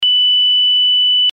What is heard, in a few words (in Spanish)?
CONTINUO; SONOLAMP 59; SERIE 59